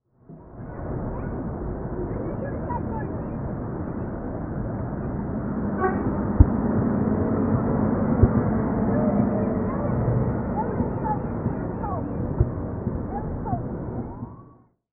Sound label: outdoor without